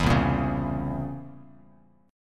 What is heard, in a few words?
C 7th Flat 9th C down Bass Standard; C7b9 strummed; JGuitar